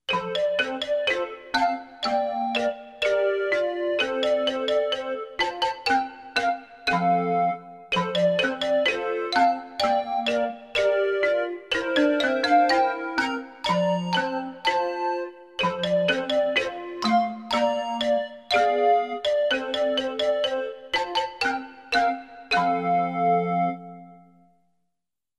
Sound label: happy happy birthday music only, childrens songbook spanish